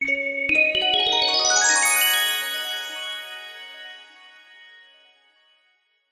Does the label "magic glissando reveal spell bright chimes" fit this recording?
Yes